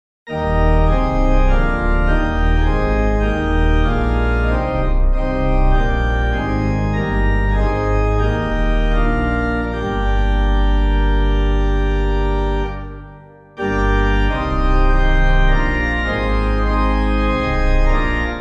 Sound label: organ